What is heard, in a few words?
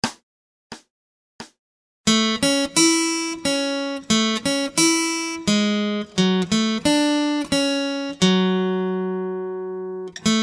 Acoustic Accompaniment, acoustic guitar; Grade 1